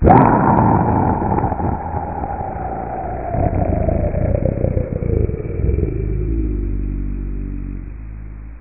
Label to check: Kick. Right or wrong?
Wrong